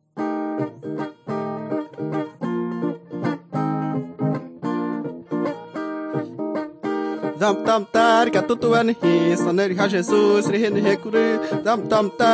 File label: singing to god